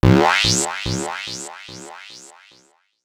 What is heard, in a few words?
Zap, PsyTrance